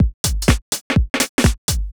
HOUSE BEATS 125

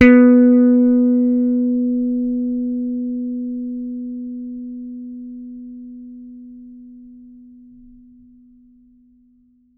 T-BIRD BASS PLUCK B3; Bass Mace; DirectWave

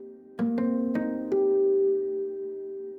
ethno, 160, C

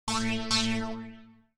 TEKNO 140, Techno; Techno2; Sphere

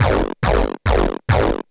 Industrial 140

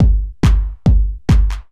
DRUMLOOP TEKNO 140; Techno2

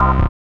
dance chord

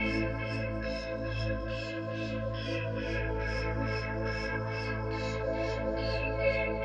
techno pad 140; Techno2; Keys

